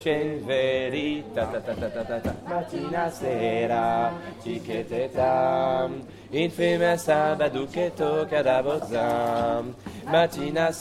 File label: bass